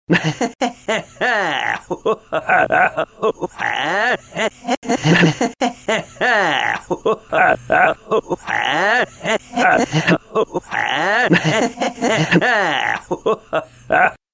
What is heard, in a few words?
laugh mix